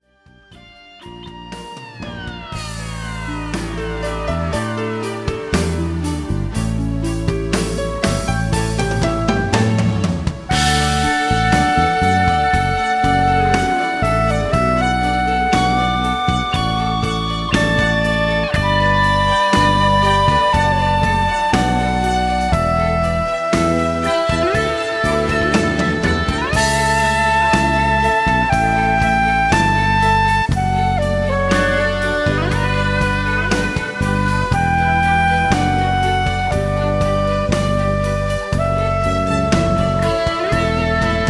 Chant du sax

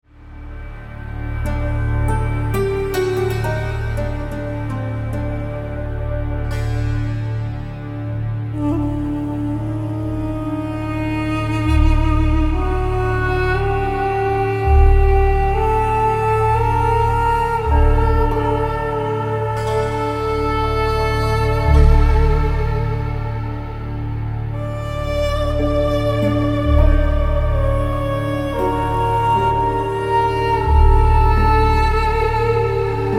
LAMENTO OF DUDUK

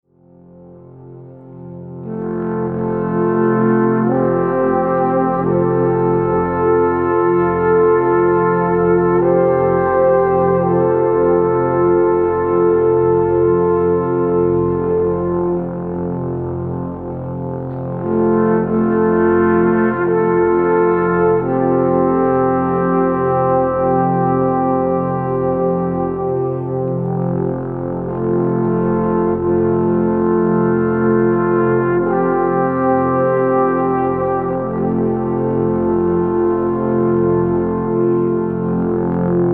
Choir of the alphorns; Chor der Alphörner

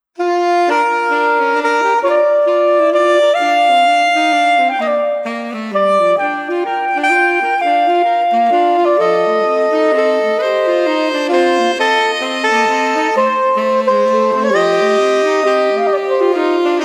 Trio

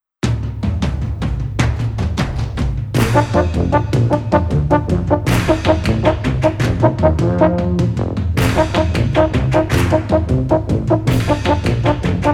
Balkan Landler

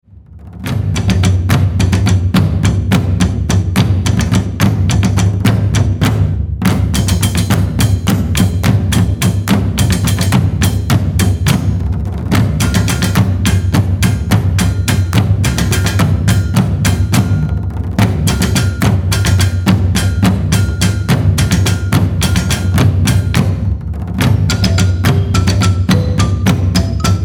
Medieval foot soldiers drumming; Landsknecht

